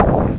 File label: clear 1 row; Tetris Max 2.3